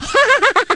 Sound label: baby laugh; baby mario